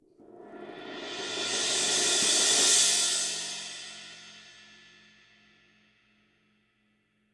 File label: hi ride roll, gretsch kit